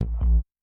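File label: jungle bass; Yamaha DTXpress-II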